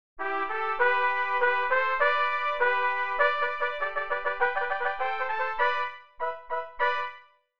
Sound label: fanfare brightly